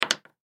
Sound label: Pool Chalk Set Down, Pool Chalk Set Down Chalk; SPORTS; Blastwave